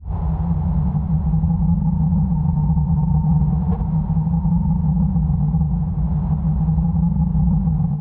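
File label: dark haunting evil rumble background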